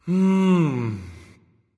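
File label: nonverbal content; smite; voicepack; ullr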